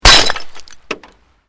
Glass pane puncture; Material components for constructing more complex sounds; Materials; Foundation

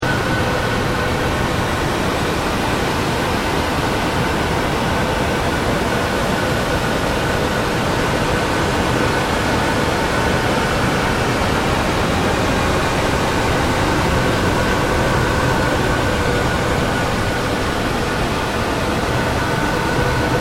Ambient Noise, Drone; Sound effects created by manipulating other sounds; Drones; Sound Design

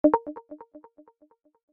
Short Multimedia Alert Effect, Chime; Chime Sound Effects; Chimes (Sound Effects:Multimedia); Stuart Duffield

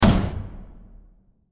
Deep Impact Blast With Reverb; Impacts; Crafted Signals

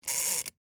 Electric Timer Turn, Dial, Clicks, Antique; Technology; Miscellaneous Electronic; BlastWave

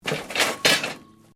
METAL CRASH; Materials

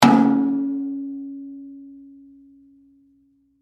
Impact Metal Tank, Water, Heater, Hit; Crash Sound Effects; Crashes; Objects

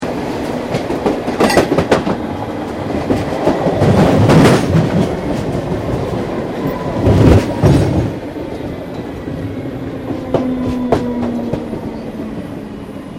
Train Melbourne Interior Stopping; Public Train in Melbourne; Vehicles

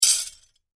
household cutlery, Cutlery Clatter; Metal Cutlery Clatter; Kitchen

